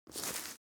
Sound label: step grass step; foley; smartsoundfx